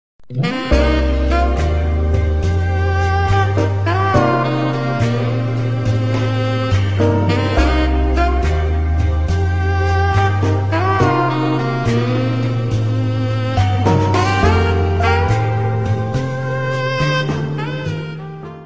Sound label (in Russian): Мелодия Саксофона